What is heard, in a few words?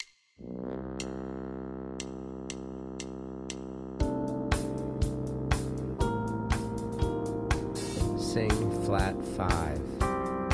C Slash Chords No Bass Alto; slash chord ear training